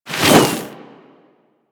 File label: Ice Proj Whizzby; Warframe; Corpus; Arachnoid Camper Terra; Abilities